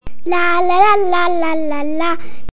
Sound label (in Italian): per fare questo suono bisogna cantare